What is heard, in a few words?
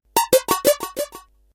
space Trash; kenney digitalaudio